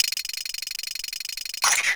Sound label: Mecha Koopa Revive; New Super Mario Bros. Wii